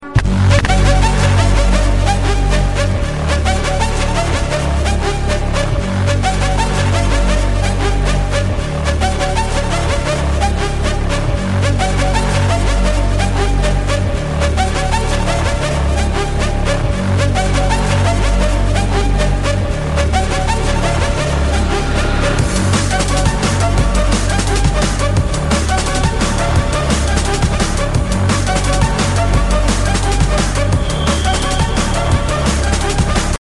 Drum Bass; драмчик!

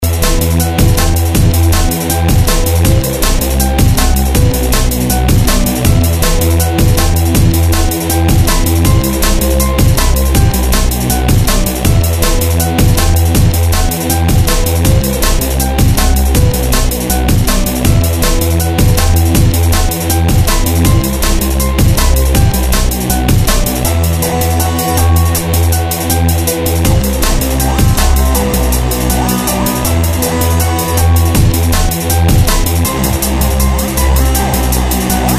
dnb; нарезка Dram And Bass

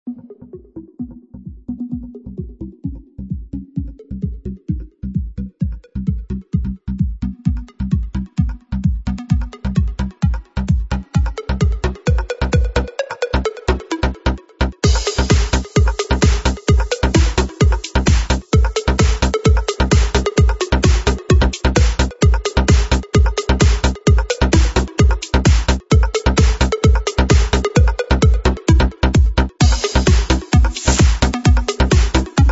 Jingle Bells remix; Новогодняя темка!

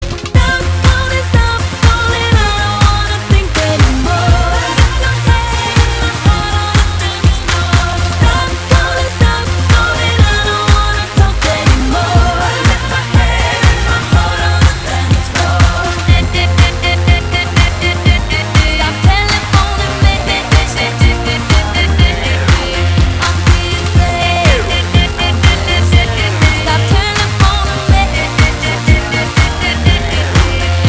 ringtone

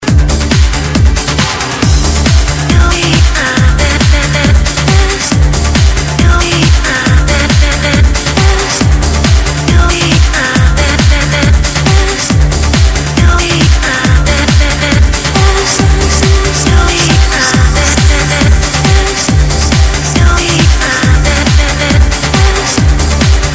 Future Trance

